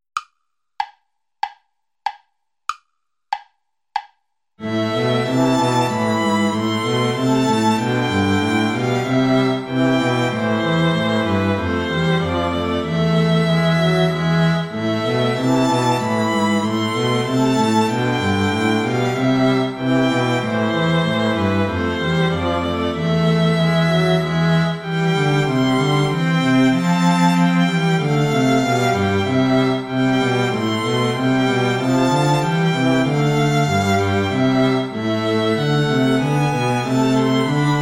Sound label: play along without violin; bach johann sebastian; ich steh deiner krippen hier